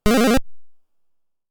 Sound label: Time Bomb Set Sound